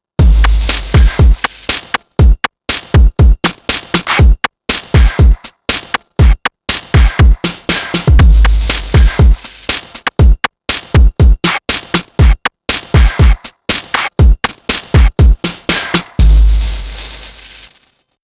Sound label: 120 BPM Rock Groove